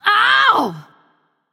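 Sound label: ouch, female; smite; voicepack; hera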